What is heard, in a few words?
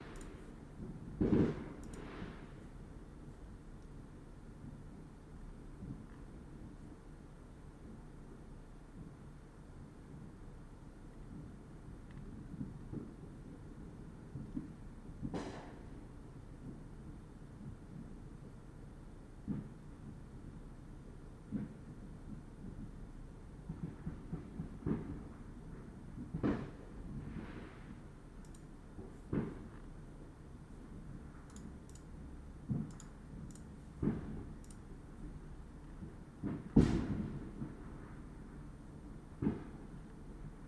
4th of july ambience